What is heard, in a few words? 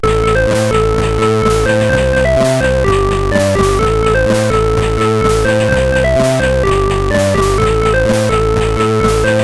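Fun, MOS 8580 R5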